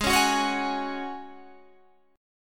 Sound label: F sharp Suspended 2nd G sharp